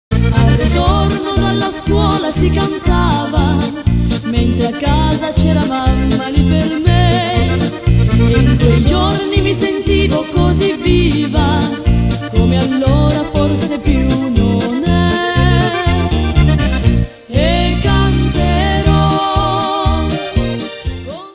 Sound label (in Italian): una voce e una chitarra